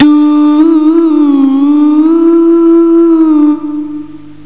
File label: CHOIR; psion cd vol1